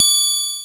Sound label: Casio SA-10 Triangle; 300 Drum Machines